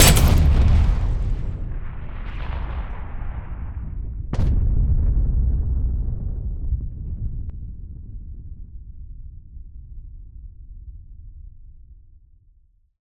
howitzer shot distant explosion; Bluezone; Artillery

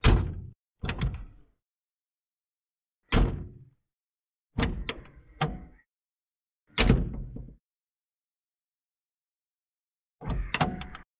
Fiat Car Indian Interior Door Opens and Closes; Auto; The AudioVille

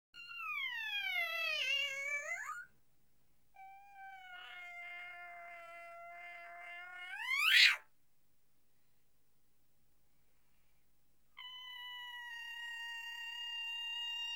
Animals, Cat; BBC Sound Effects Library

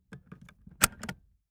seatbelt buckle in, Car Interiors; Sounds Great

